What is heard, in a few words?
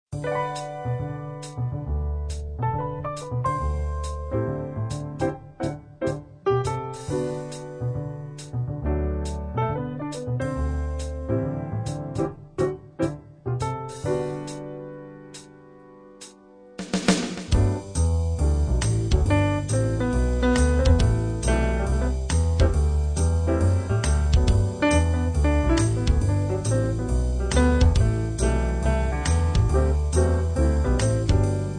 Pianist